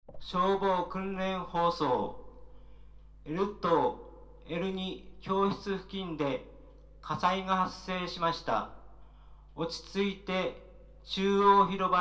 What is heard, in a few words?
fire alert